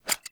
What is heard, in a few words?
Pod Shotgun Reload; Warframe; Weapons; Tenno